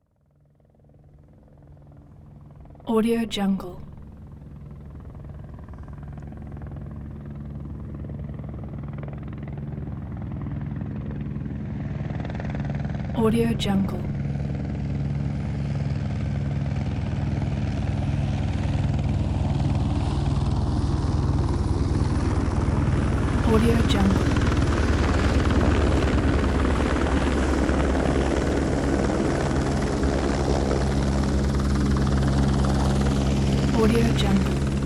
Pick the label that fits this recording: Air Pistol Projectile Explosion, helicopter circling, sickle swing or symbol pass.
helicopter circling